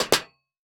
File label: Foley Footstep Hard Sole Dress Shoe Jump On Metal Platform; Foley Footsteps